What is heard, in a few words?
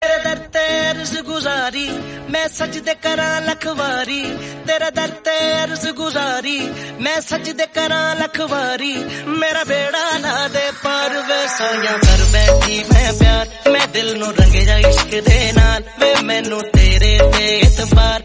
Punjabi song tone ringtone; bollywood ringtones